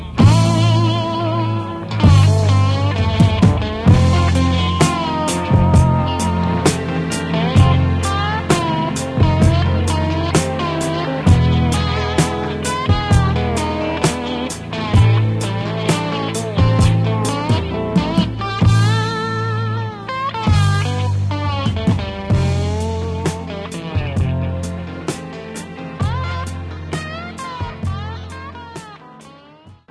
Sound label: guitar solo